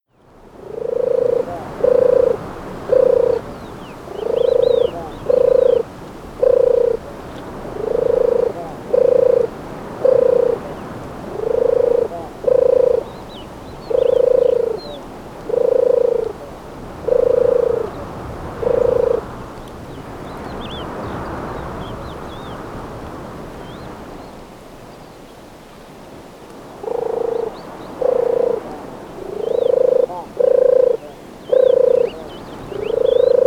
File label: Turtle Dove